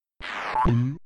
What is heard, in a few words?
gulp